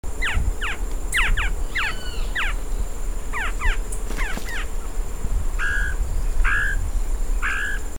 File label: Dysithamnus mentalis nyurr